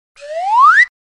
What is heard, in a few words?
Slide Whistle Up Sound Effect